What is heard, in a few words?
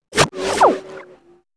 ZAP